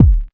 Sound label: kick